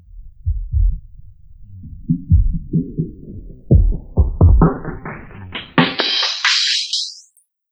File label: FILTERS R, Dark Side Of TripHop; USB Soundscan vol.35; AKAI